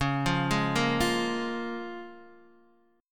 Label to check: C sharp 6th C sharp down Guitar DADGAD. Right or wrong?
Right